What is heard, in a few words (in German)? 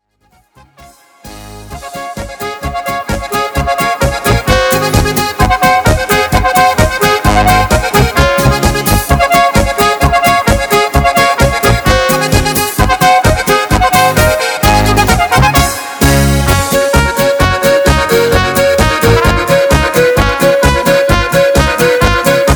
Trompeten Echo